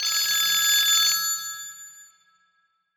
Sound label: Old Phone